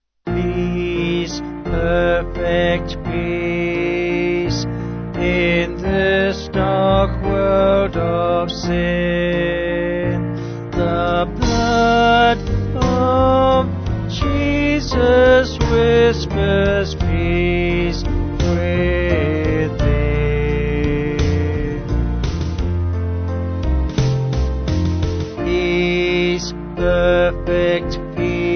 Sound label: Peace Perfect Peace Pax Tecum Vocals Band